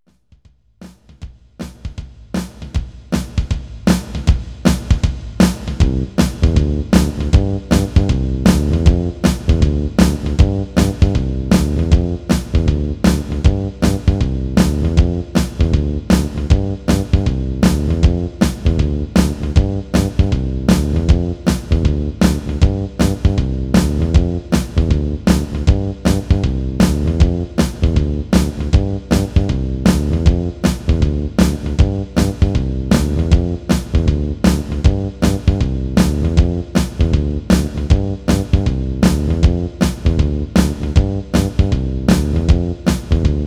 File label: instrumental